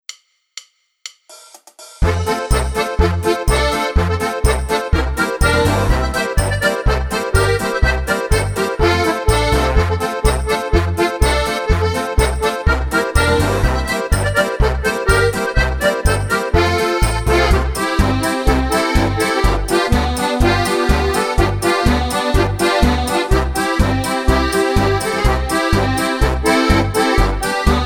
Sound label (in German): Diatonisch Oberkrainer; Wersi